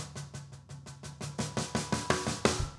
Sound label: jungle 170 fill